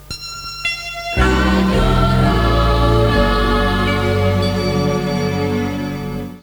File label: jingle radio; raurach